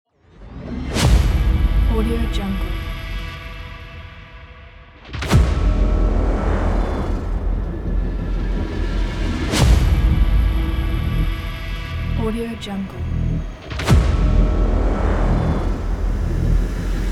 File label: intense dark trailer